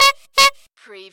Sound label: CARTOON BIKE HORN DOUBLE